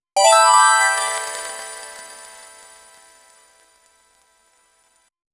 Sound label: short cheerful sound effe; gambling